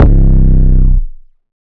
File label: 808s